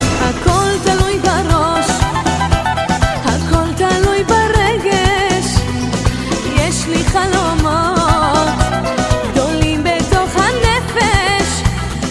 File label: hebrew song